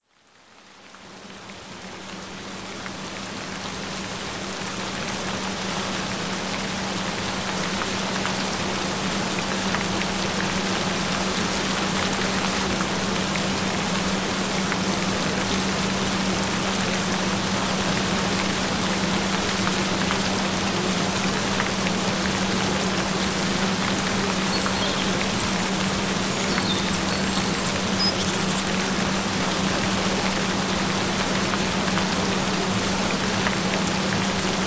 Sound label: Gentle Rain Sounds